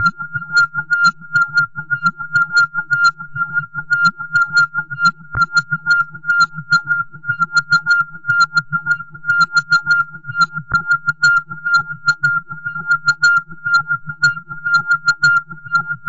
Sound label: Strange Noise